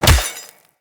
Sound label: Melee Fist Impact Robotic Flesh